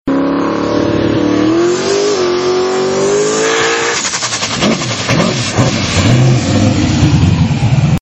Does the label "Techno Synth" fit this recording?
No